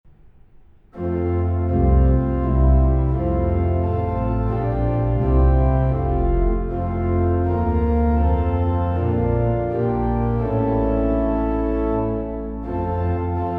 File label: stflavian, hymns organ